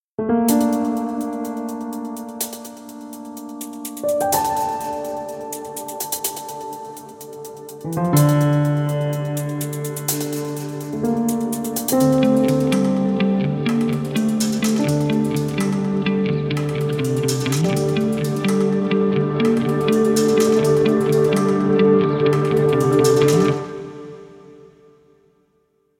Intro Guitar theme; Terra Invicta

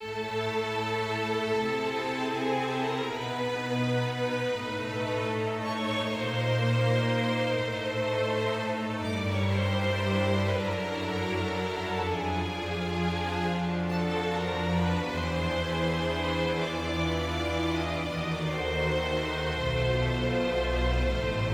dark forte

